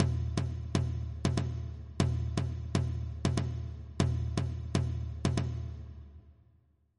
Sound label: soukous; Creating Rhythms